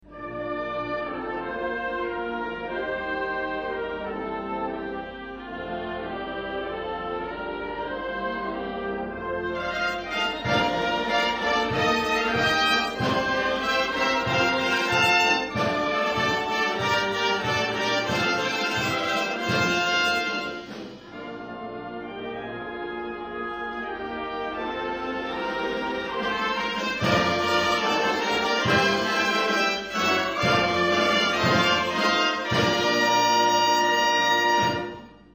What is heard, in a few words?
Saint Lucia Instrumental Anthem